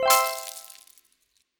bar filled; Skillzgaming; gems odyssey 92 desktop

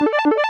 counter loop; astro Legends Lyra and Erion Desktop; Foxium

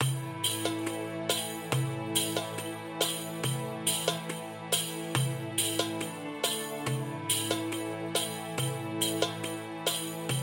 music intro screen; medallion; Fantasma

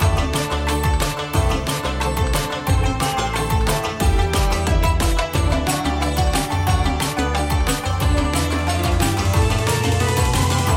music bonus; medallion Desktop; Fantasma

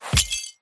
hit; Fantasma; hades River Of Souls

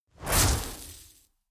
lava stone selected; Skillzgaming; battle royale desktop

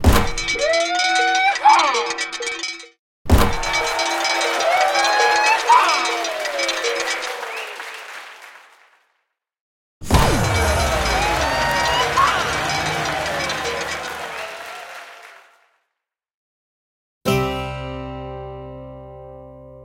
Win Sounds Big Win; oink Country Love; Mahi Gaming

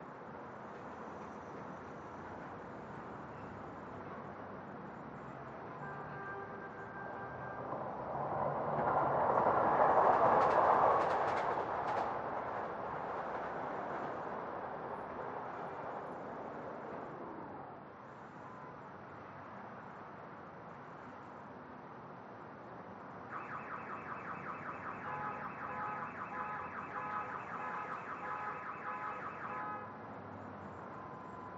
idle ambient sfx main; Recode; rampage riches Desktop

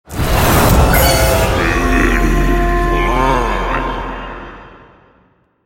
Free Spins Awarded; fire Wizard; Wizard Expands On Reel; Axial

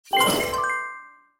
Symbol Low Pay; fire Wizard; Axial

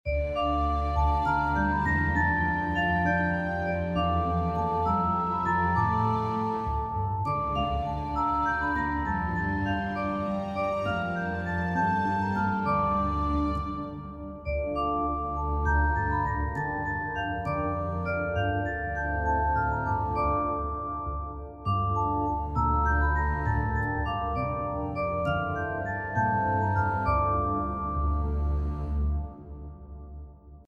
Background; Mirror Magic

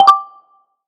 popup; astro Legends Lyra and Erion Desktop; Foxium

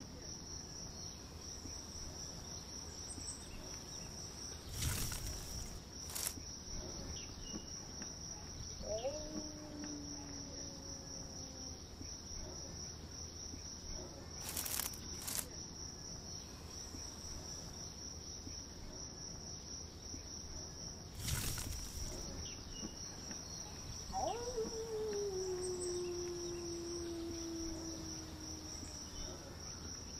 Base Music Loop Layer; Wicked Tales Dark Red

